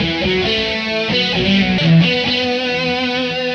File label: E5 134 rhythm; Loops